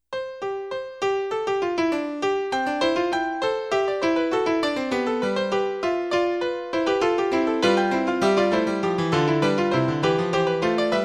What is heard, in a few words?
little fugue